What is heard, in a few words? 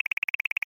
message display, button